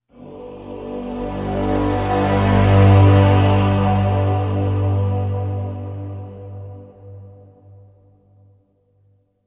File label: Epic Growth G Major